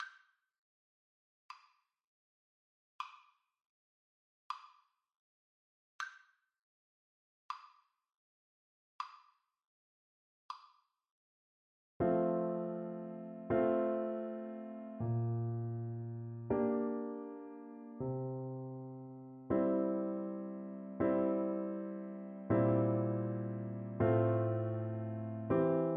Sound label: romance, french horn